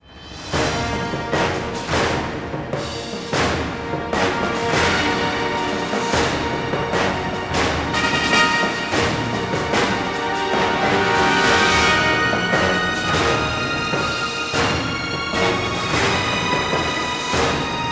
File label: Sicily Action